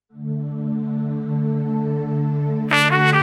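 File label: Taknavazi Trumpet, solo trumpet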